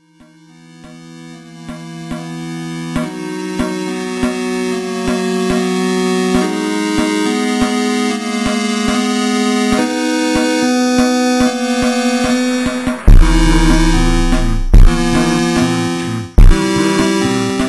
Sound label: Funk ultra Slowed